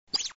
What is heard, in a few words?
click